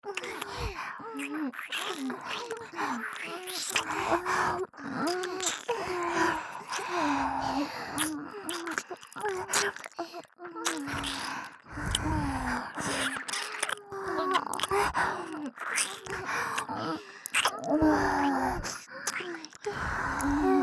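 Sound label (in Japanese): 作業喘ぎ＋両耳舐め; 小春; 美冬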